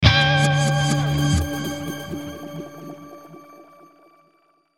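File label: Musical Element, Drum Snare March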